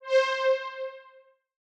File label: Pad C5; FL Studio 12